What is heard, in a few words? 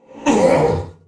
Wound Crit; Creature; Deathbringer Saurfang